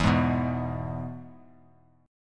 E Major chord; strummed; JGuitar

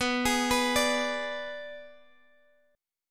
B Minor 6th B, Guitar Open G

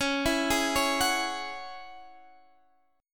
E 6th Add 9th; chord shape

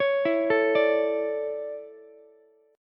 D Major 7th Suspended 2nd, Ukulele; DM7sus2; arpeggiated; JGuitar

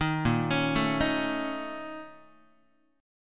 A sharp Minor Major 7th Double Flat 5th, Guitar; arpeggiated; 6,6,4,7,7,x; 4A,4E,5A,5D,5G#,6C#; JGuitar

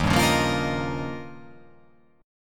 D sharp 9th D sharp

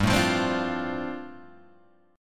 G 7th Suspended 2nd Suspended 4th, Guitar Open G

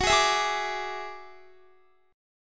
F sharp 7th Suspended 2nd Sharp 5th, Guitar; F#7sus2#5 strummed

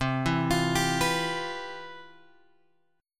C Major 11th C; CM11 Chord {8 7 X 10 8 7} arpeggiated

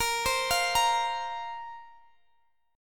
A sharp Suspended 2nd A sharp down Guitar Open G

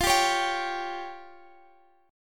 F Suspended 2nd Sharp 5th F down Guitar Standard; strummed; capo 9; chordshape

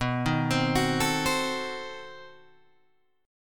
B 7th Flat 9th B Guitar Open G

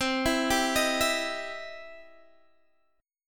E Minor Major 7th Sharp 5th C

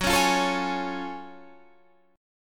Gb Minor Major 9th Gb down; strummed; JGuitar

